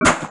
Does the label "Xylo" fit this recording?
No